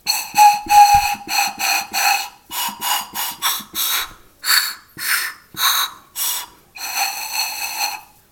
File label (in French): flute de pan